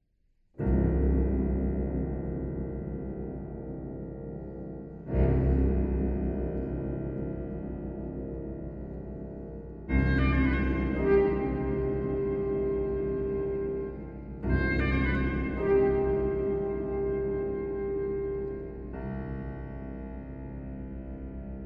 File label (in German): Lied Oboe; Mahler; Lied 6